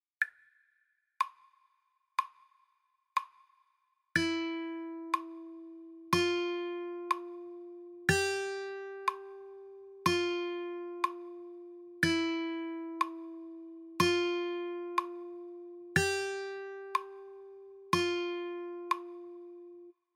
1st string half

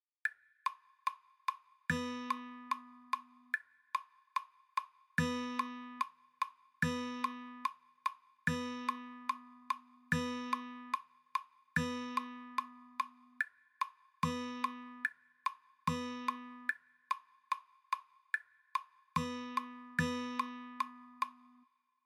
rhythm practice fast, guitar

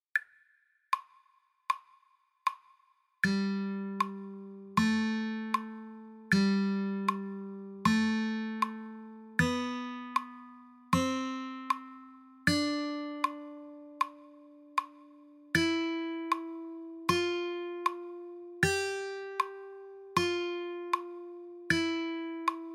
3rd String mix G, guitar

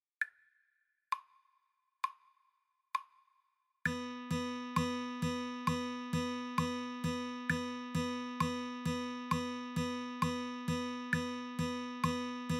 8th 4 bars, guitar

